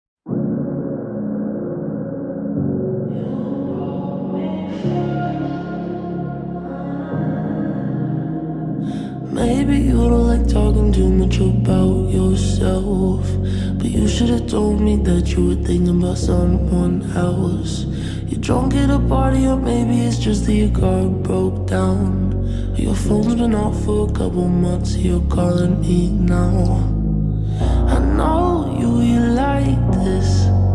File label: slowed reverb bass boosted